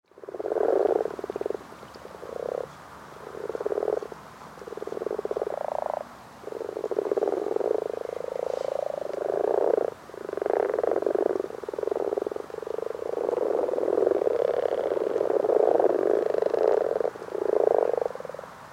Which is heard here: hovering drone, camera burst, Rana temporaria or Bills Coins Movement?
Rana temporaria